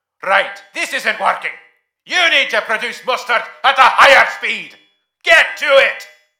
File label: faster